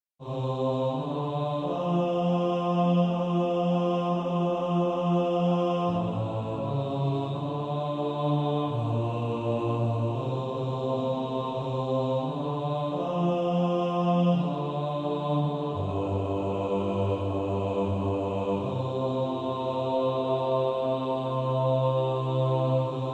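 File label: bass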